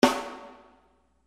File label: 20 ply snare